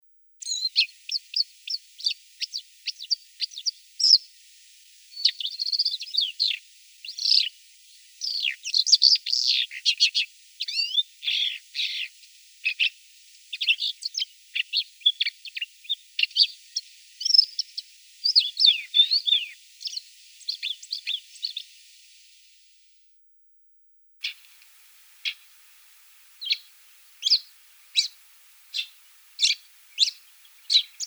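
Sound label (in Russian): ZHulan rizhehvostyj, red-tailed shrike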